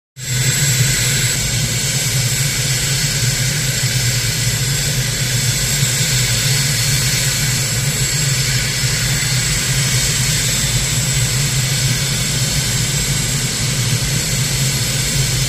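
Idle With Exhaust Hiss And Engine Rumble, Sound Muffled As Canopy Is Closed With Clicks And Movement, Interior Engine Throttle. Close Perspective. Jet.; Gnat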